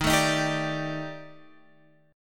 D sharp Major 7th Flat 5th, down, chord

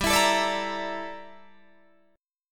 G Minor 6th Add 9th G down Guitar DADGAD

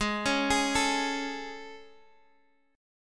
A Major 7th G sharp

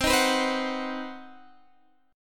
C Minor Major 7th Flat 5th C down; strummed; CmM7b5

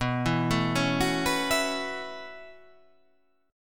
B 7th Suspended 2nd Suspended 4th B down

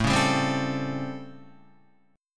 A 7th Sharp 9th A, Guitar; A7#9; strummed; JGuitar

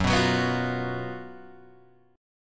Gb Major 7th Suspended 4th, down, Guitar, Open G

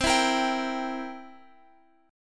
C 7th C down Guitar Open F; C7 strummed

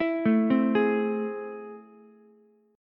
A Minor Major 7th, down, Ukulele

